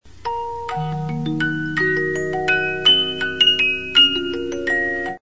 3 Parts, music boxes, 50-Note Movements; Sicilienne

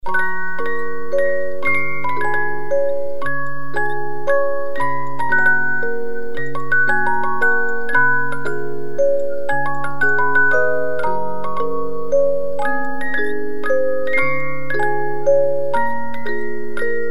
Rigoletto, 50-Note Movements

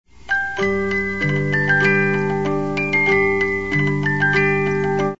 The Four Seasons Spring, 72-Note Movements

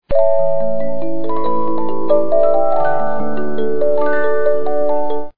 Impromptu, 72 Note music box

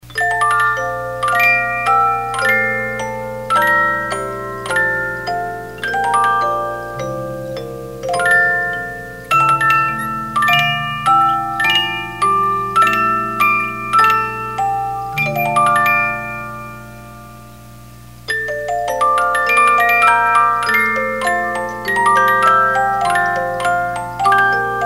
Saint Mathews Passion 2 Parts, 50 Note music box

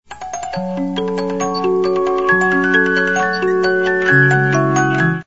Turkish March, music box, 72-Note

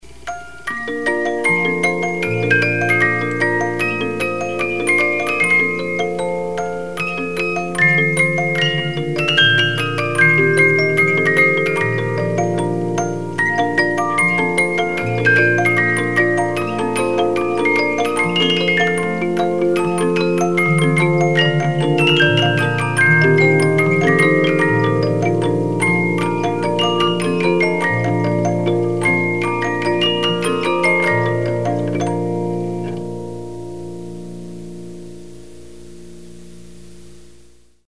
The Magic Flute Ein Madchen, 72 Note music box

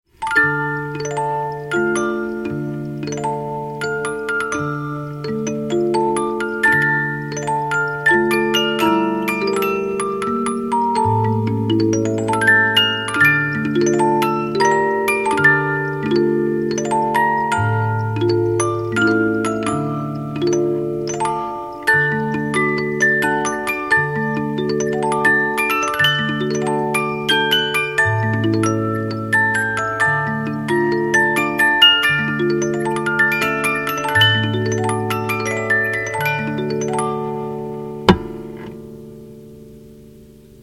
50-Note Movements

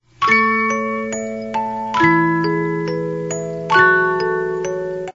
Canon in D, 50 Note music box

